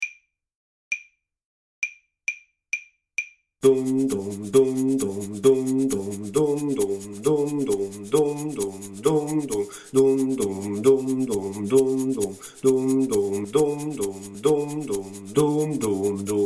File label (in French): Bas; La Lega